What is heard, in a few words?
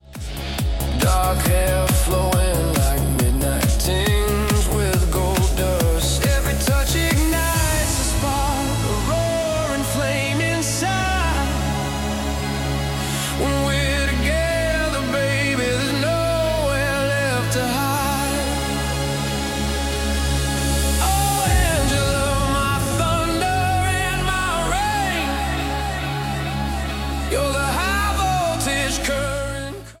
hardstyle remix